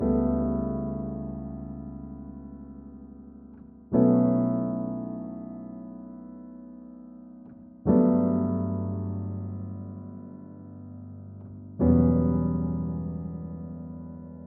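122 BPM Piano Chord Progressions Cinematic Drama D major B minor Tonic B; MMB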